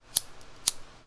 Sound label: Lighter Clicking